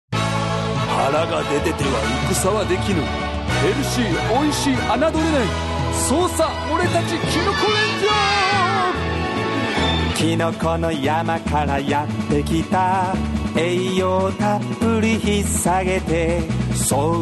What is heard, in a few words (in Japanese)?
歌のみ